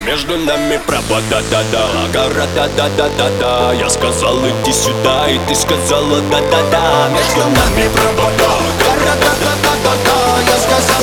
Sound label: techno remix; битовые